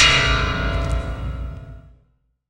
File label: dark twang, Synths; Notes